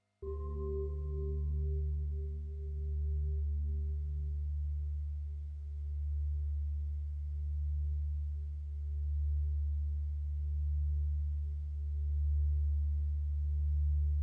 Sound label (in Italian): campane tibetane; Massaggio Campane Tibetane